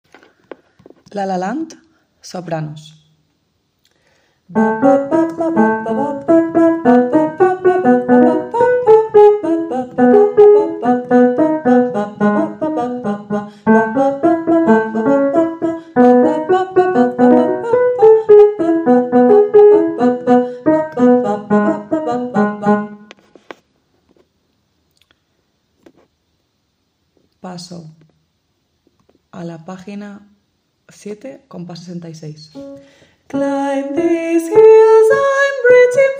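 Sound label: soprano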